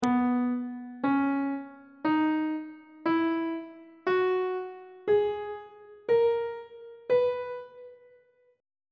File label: c flat major note positions on treble clef